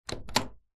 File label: household door office open small room; zapsplat